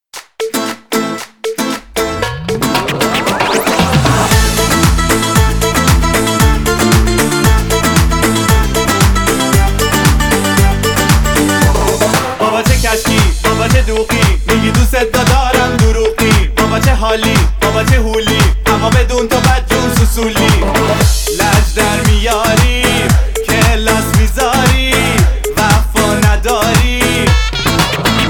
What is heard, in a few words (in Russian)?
Танец Стилизация восточного